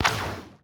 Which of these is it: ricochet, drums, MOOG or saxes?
ricochet